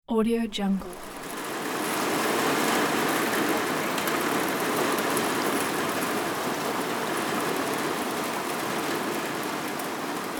rain on window

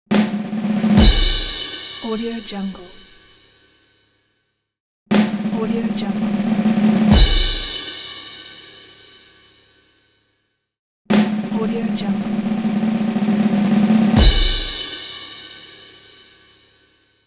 drums roll

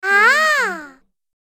cartoon girl ahh

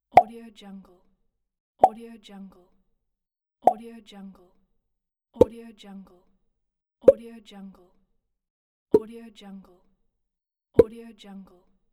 pops pack

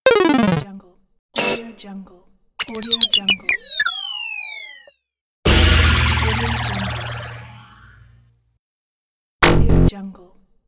failed pack

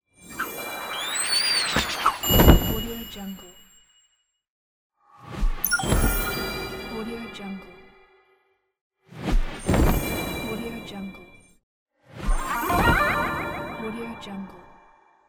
swoosh magic transformations cartoon sounds; volkovsound

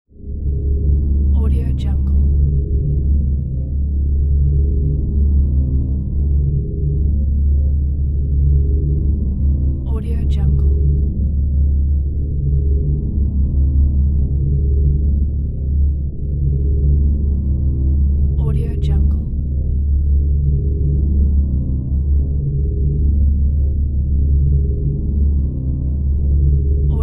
futuristic ambient; umcsound

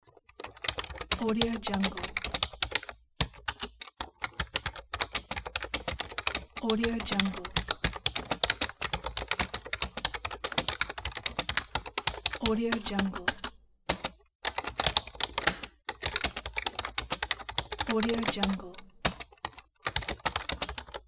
computer keyboard keys

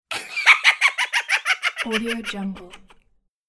laughing witch

